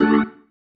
Stab One Shots, Organ

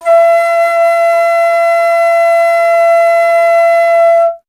Alto Flute

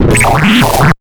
JMP 130 FX, Big Beat Drumloops; JUMP 130

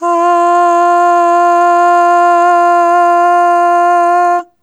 Aah Long F3 Dry; Harmonic Building Blocks

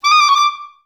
D5, orchestra; Best Service; Peter Siedlaczek's Advanced Orchestra

